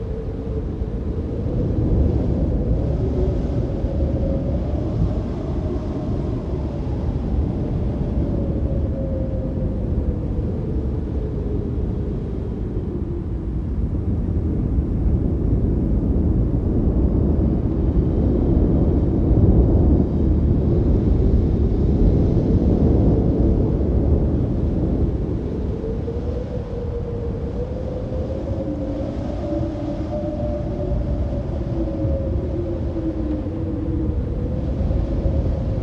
Wind mine

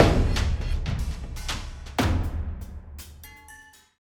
Transition; Skyway

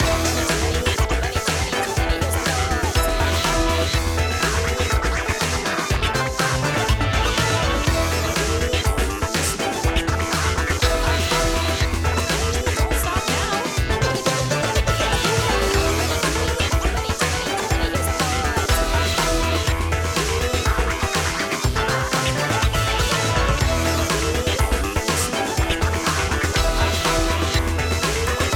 Space Bass

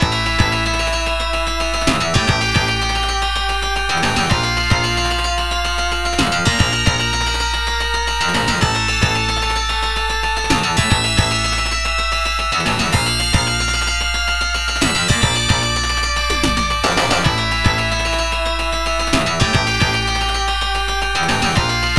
Story Sequence; shura no mon genesis

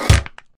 Kick

